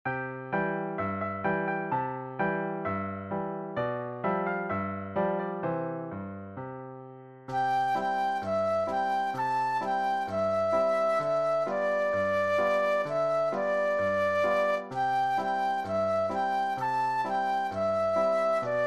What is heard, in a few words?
Flute Solo